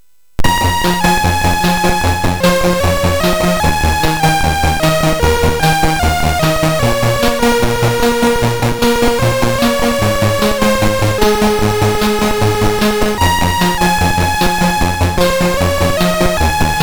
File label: Synth Sample, MOS6581R3; hvsc